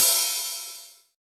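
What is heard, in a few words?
hi hat open, drum machines; EdgeSounds; Drum Mashines VOL-1; MWAVE DRUMS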